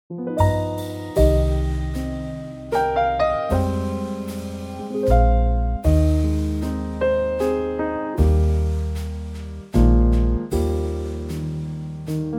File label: Eb trio